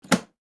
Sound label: briefcase lock